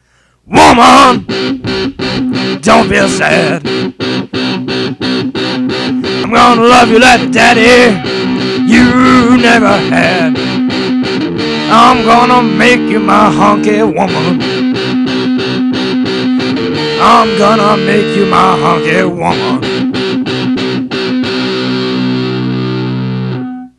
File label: honky